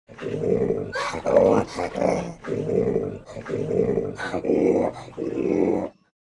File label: Orangutan breathing and grunting